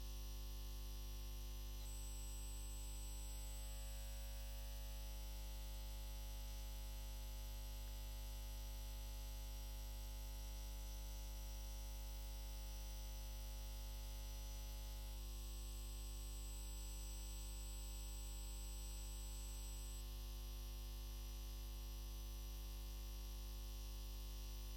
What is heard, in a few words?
Signal 8 khz